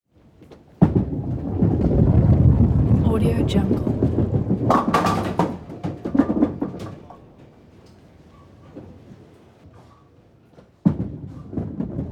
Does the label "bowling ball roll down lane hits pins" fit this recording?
Yes